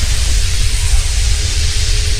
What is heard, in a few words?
lightning ray loop; Wizardry; ebwizardry